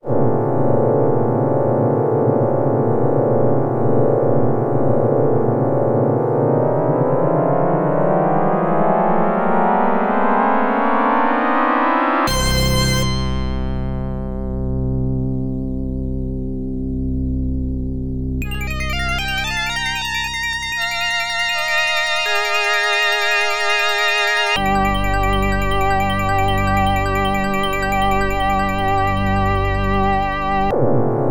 G Minor Reverse; strider hiryu; x68000

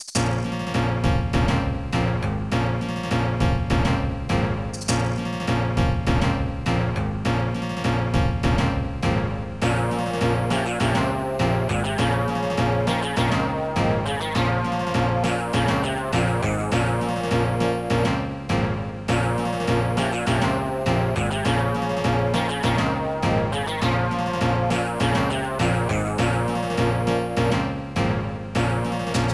Death; Hired Guns; Amiga

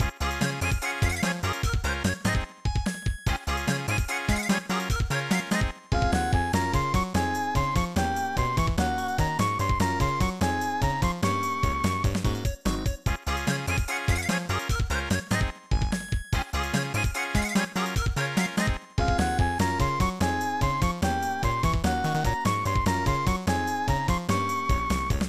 BGM Loop; super bunny world; lucky kat studios; android

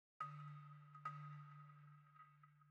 Balafon

